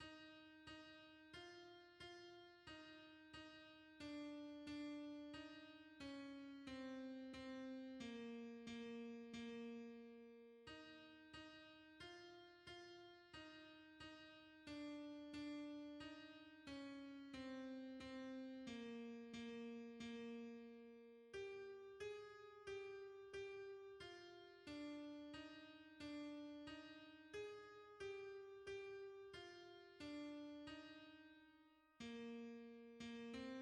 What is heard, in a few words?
alto; hymns; May the grace of Christ our Saviour